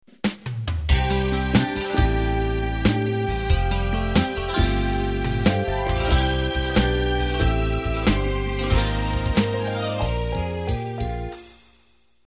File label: Slow Rock Intro; Slow Rock 2Y (12/8)